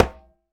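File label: Conga Lo Mute; Roland BK-5; Percussion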